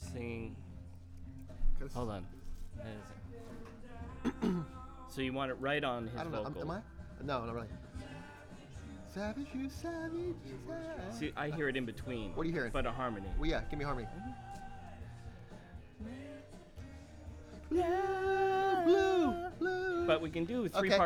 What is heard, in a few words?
VOX Back